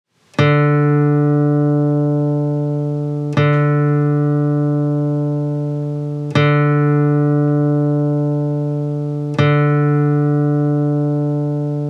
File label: tuning 4 string